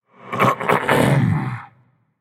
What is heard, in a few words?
clear throat; smite; agni